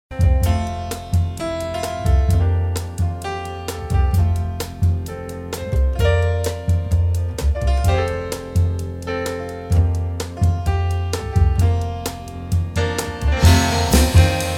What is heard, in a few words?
Auld Lang Syne Jazz Piano